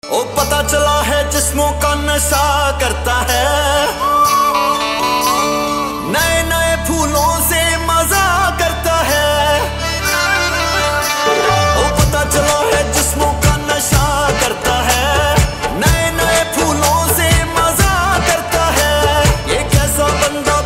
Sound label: Punjabi Song Ringtone